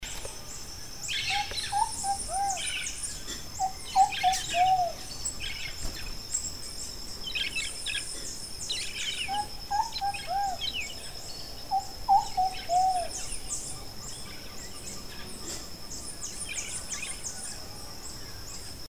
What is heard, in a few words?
Short-Billed Pigeon, Panama